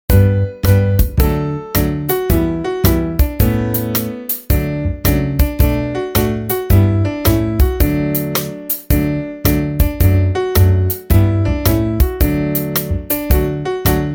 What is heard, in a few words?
backing